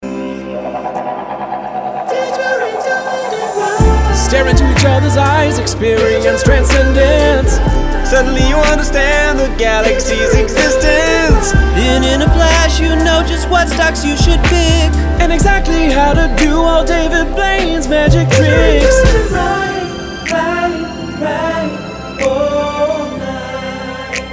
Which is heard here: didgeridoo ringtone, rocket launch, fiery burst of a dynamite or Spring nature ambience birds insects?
didgeridoo ringtone